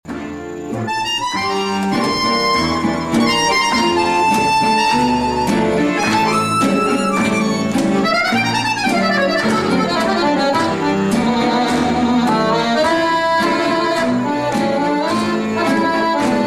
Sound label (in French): les deux guitares